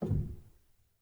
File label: rel, Grand Piano; bitKlavier Grand; Piano Bar